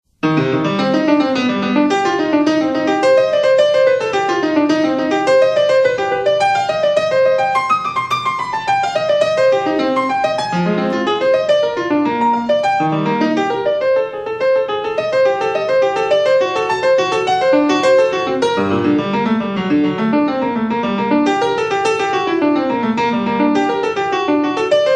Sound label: Solfeggio